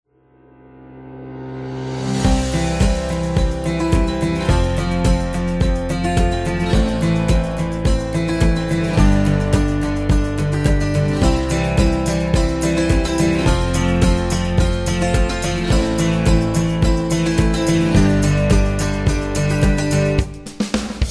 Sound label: Guitar Acoustic Inspiration; happy music vol 9